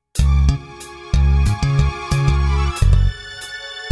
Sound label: bass string